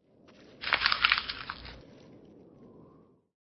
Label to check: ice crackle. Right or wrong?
Right